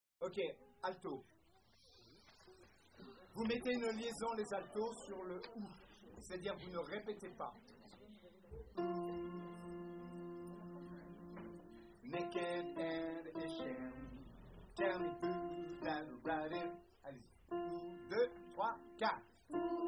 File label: alto